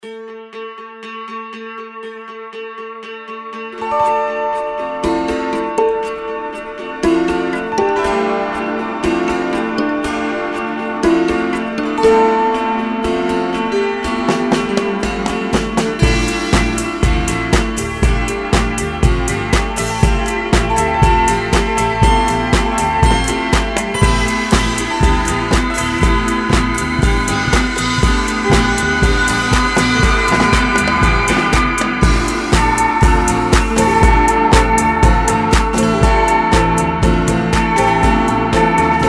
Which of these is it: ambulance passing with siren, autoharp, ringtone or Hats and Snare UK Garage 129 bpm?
autoharp